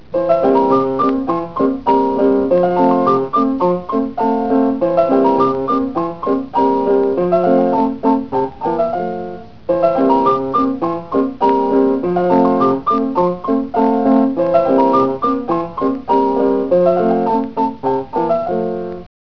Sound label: polka; smithson-to-smithsonian